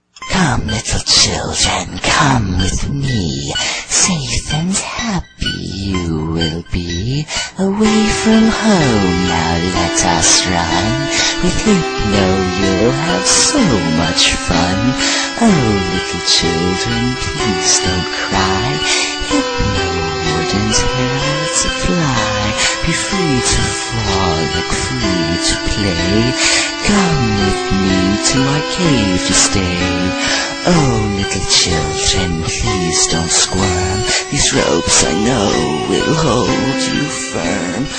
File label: lullaby; hypno s lullaby creepy pasta